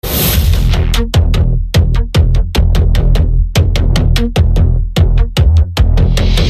doombeat

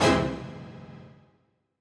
oops

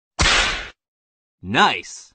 whip crack nice

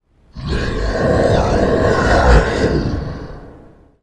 large predator snarl

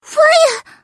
fire

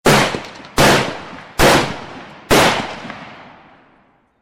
gunshot

